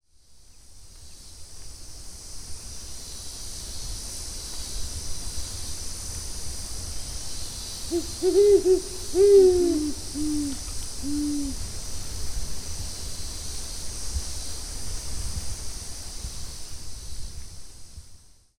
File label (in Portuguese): Bubo virginianus Jacurutu